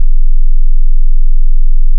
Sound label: Sound 20hz Loop